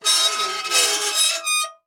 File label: Metal Squeak Scrape